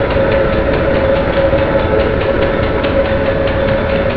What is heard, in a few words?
bigmove